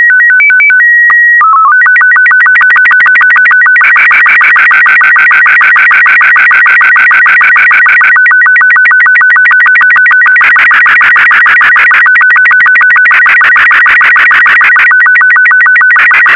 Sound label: test, SSTV